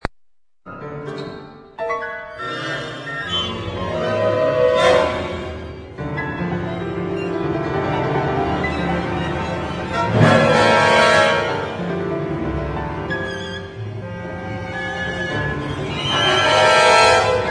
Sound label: Piano Concerto